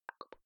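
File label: Ui Click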